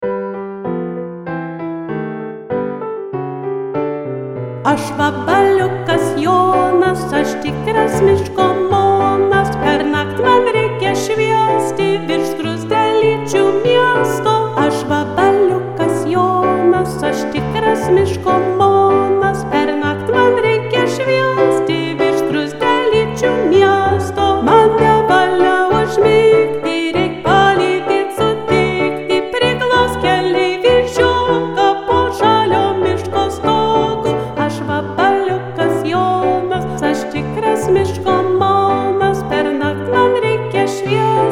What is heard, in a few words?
soprano